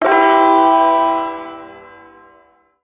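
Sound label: Shawzin Major Chord; Warframe; Duviri Ersatz Shawzin; Chords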